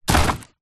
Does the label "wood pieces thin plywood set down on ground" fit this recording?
Yes